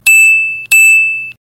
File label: Ting Ting